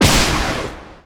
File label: Lob Shot